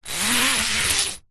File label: trampoline net zip open or close; sport; zapsplat